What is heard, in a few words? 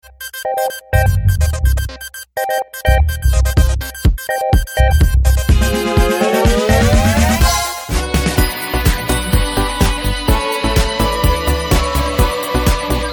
Techno Rock